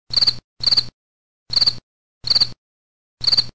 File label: chirping